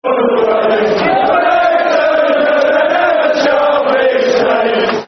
Rule Britannia [Football Chant (Soccer Song)], England